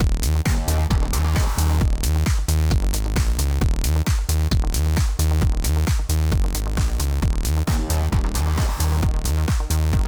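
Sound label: Loop Battle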